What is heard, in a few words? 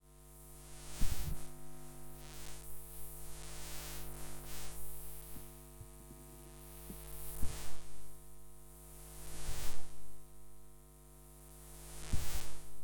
Interference - Guitar Pickup - Buzz Hum - Thin - Swells - Hits Thumps; Pole Position; Electric Guitar Pickup Interference